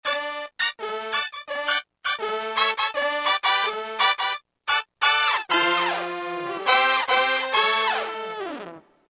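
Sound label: trumpet fx x3; Roland M-DC1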